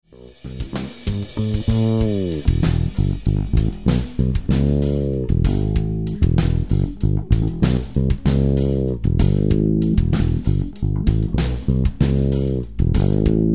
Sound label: fretless